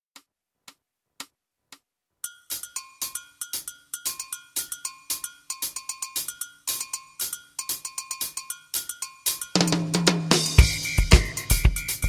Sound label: Jingle Bells